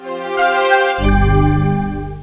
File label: open; Quicken